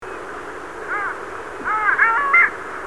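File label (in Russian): moryanka golos, long-tailed duck voice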